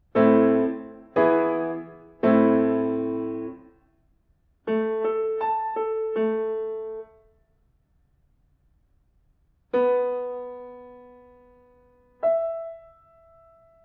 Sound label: diatonic collections